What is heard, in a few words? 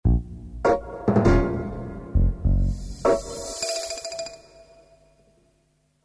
jingle